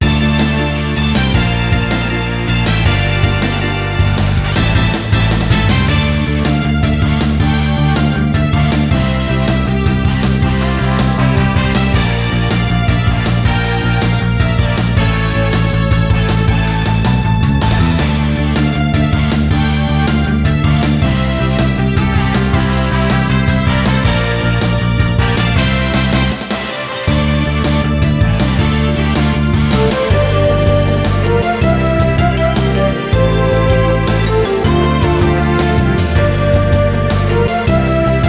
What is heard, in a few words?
Music Menu event; Jikkyou Powerful Pro Yakyuu Championship 2017; PS Vita